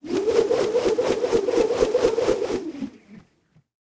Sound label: Weapon Mace Whip Circular; AdobeCC